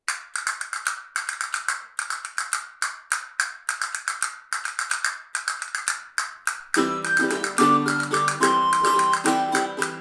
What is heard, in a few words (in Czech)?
flétna + barokní kytara + bicí; Bál; Flautoškola 2 – METODICKÉ NAHRÁVKY a DOPROVODY